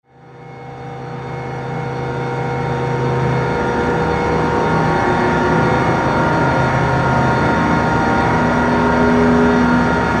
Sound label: SIREN